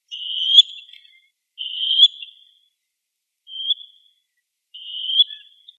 chernosheynaya poganka golos

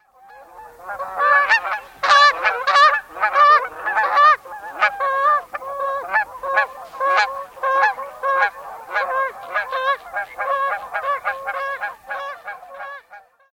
kanadskaya kazarka golos

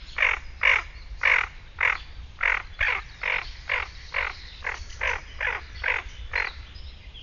hohlataya chernet golos

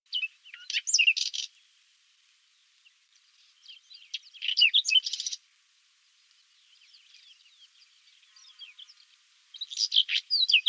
lugovoy chekan golos

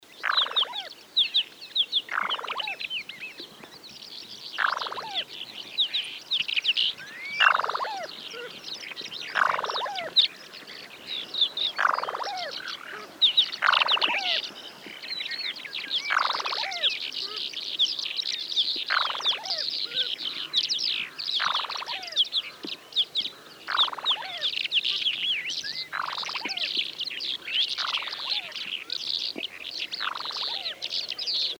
chernobryuhiy ryabok golos

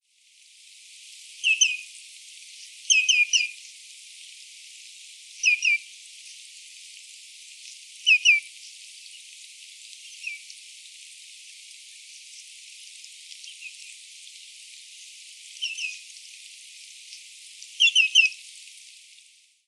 bolshoy ulit golos

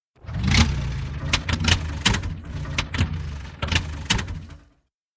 zvuki dvigatelja

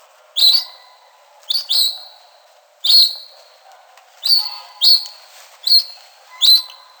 daurskiy zhuravl golos

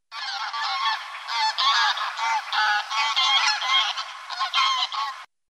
seryy gus golos

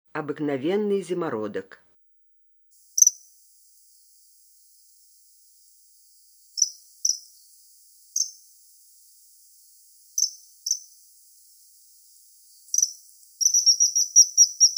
obyknovennyy zimorodok golos

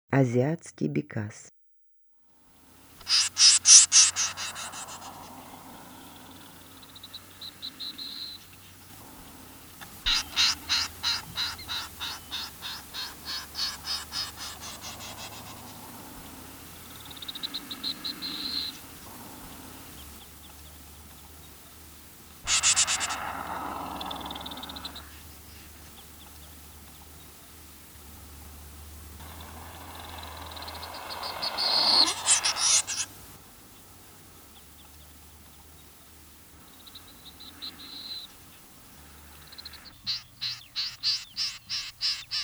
aziatskiy bekas golos

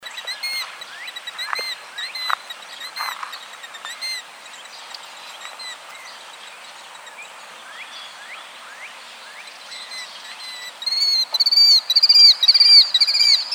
zuek dvugalstuchnyy golos

